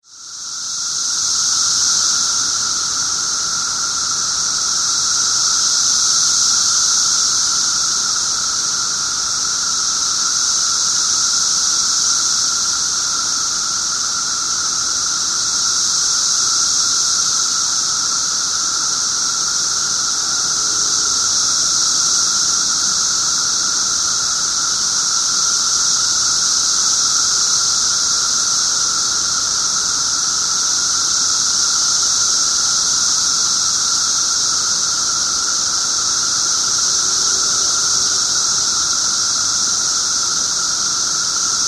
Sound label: cicadas, 17yr cicada; Excerpts of the 17-year Cicada Brood XIII from Glenview, IL (2007)